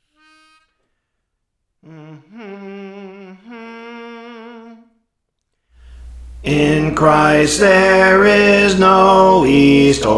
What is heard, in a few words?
Bass; Praise For The Lord Training